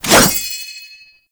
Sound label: Sword Swipe; Warframe; Duviri; Dax; Weapons